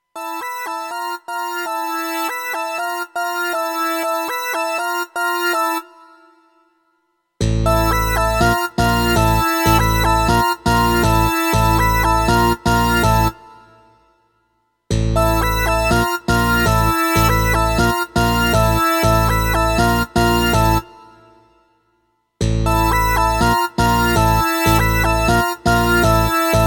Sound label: ringtone; bosscalling; openmoko; OM-2007.2